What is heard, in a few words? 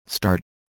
Marsh Bird Playback